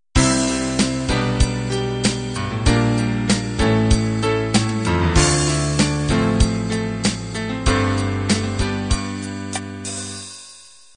rock ballad intro

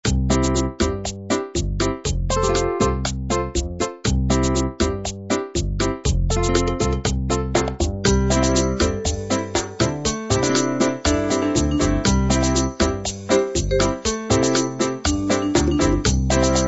rhumba

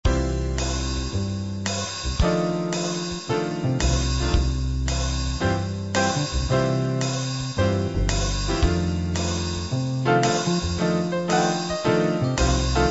slow swing

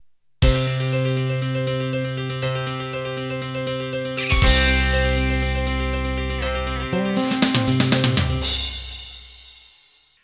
Fire Rock Intro

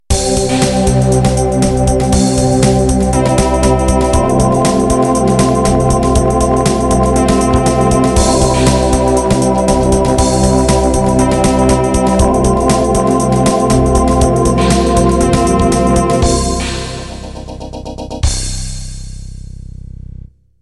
Jungle end; Ending #2

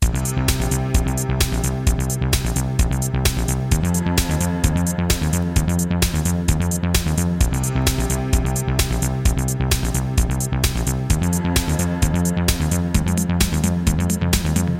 prog

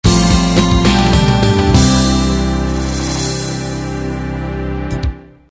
standard 8 beat end

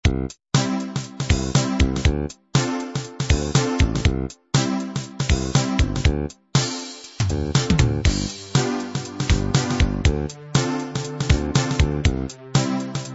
hip hop

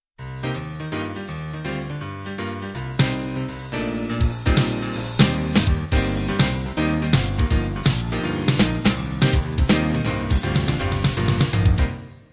boogie woogie intro

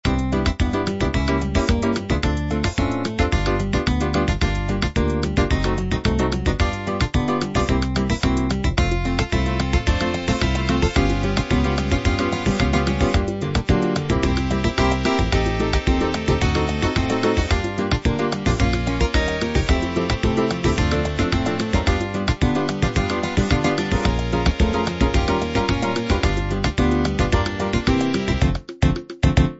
samba

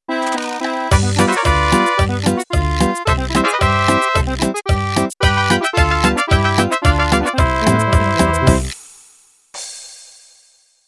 Mariachi Intro

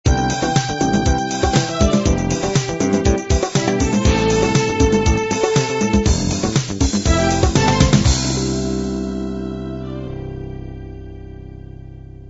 disco miami end

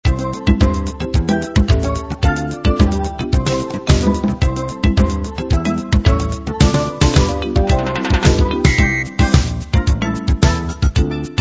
caribbean jazz

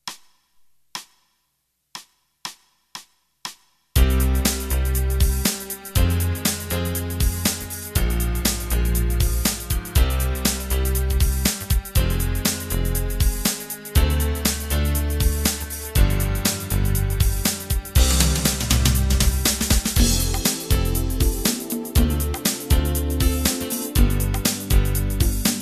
Fusion

